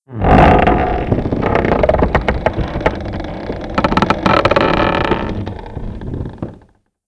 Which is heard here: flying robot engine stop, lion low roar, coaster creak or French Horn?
coaster creak